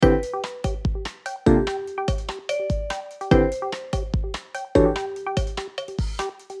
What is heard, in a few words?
Short mistery